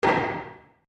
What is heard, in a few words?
Wood On Wood Table; Material components for constructing more complex sounds; Sound Effects:Materials